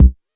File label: Tech bd, Jomox Airbase99; Drum Machines